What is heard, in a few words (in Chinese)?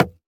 竹木按钮点击声